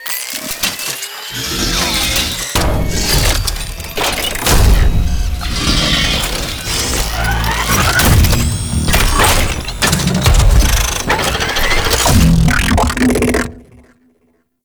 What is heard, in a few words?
Mech Spawn; Nechro Mech; Vehicles